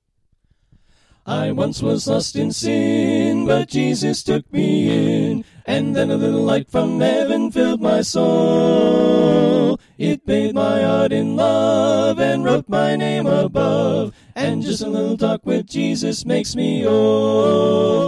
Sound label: Bass Right